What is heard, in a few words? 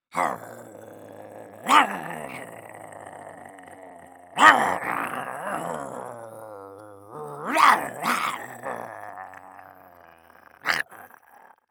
Horror Scary Werewolf Growl